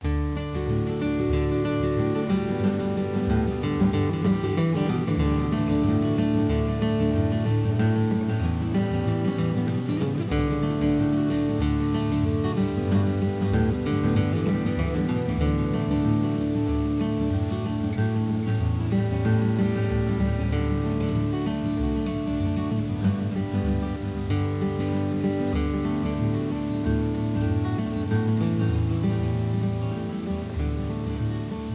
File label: countri